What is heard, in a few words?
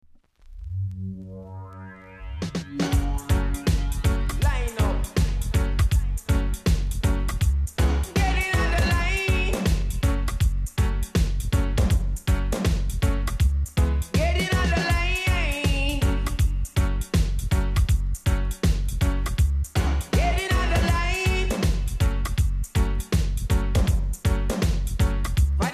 PAN SOUND